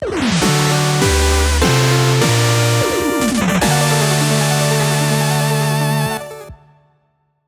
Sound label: Boss Victory; For Shovelry!; Shovel Knight Original Sound Version Stereo Edit